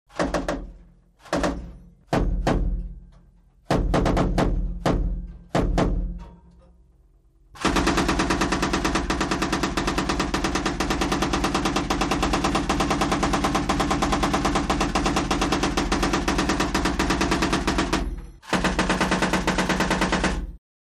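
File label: 50 Caliber Machine Gun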